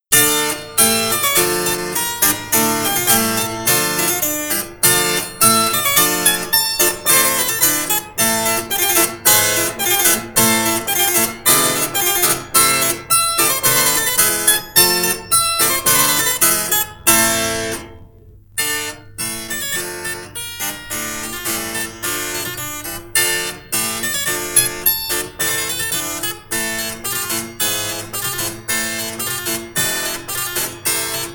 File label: Harpsichord Sonata in D minor; Kk. 64; Domenico Scarlatti